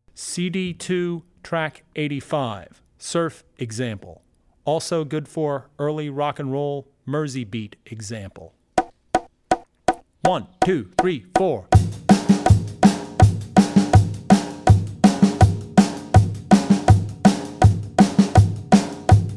Surf - Mersey Beat; Drummer's Bible Tracks

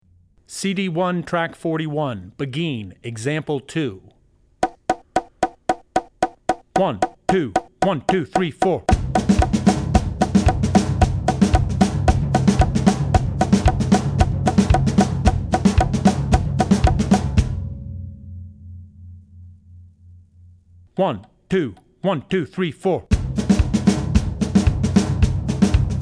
Beguine; Beguine 2; Drummer's Bible Tracks

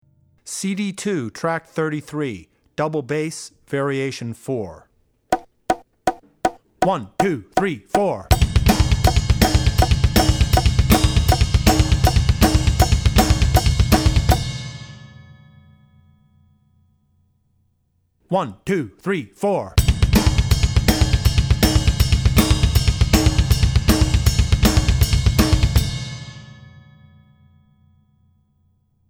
Heavy Metal - Double Bass; Metal - Double Bass (Variation 4); Drummer's Bible Tracks

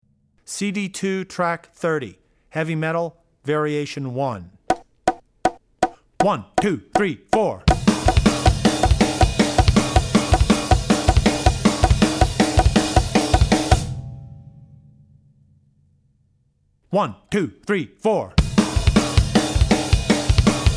Heavy Metal; Metal (Variation 1); Drummer's Bible Tracks

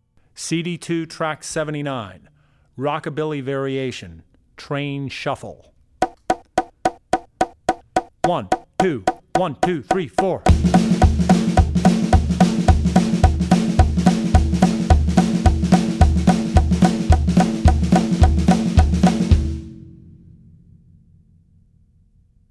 Rock N' Roll - Rockabilly (Train Shuffle); Drummer's Bible Tracks

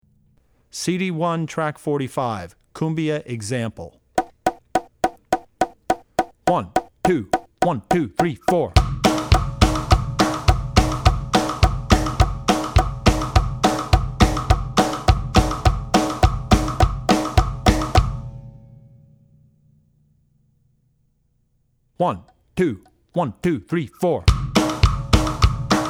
Cumbia; Drummer's Bible Tracks

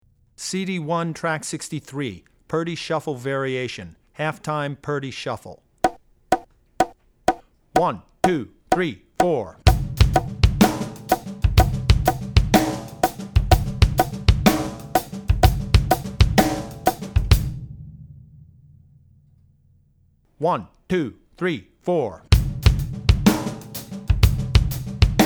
Shuffle- Half-time Variation; Purdie Shuffle; CD1-Track63 Purdie Shuffle Half-Time; Drummer's Bible Tracks

